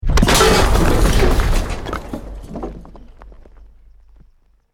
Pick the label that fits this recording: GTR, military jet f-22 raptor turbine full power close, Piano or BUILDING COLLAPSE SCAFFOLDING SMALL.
BUILDING COLLAPSE SCAFFOLDING SMALL